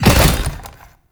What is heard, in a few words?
Motorcycle, Collision Fast; Warframe; Vehicles; Motorcycle Ninety Nine